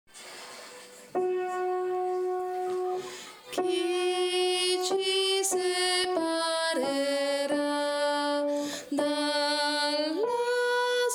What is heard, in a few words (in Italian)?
separera soprani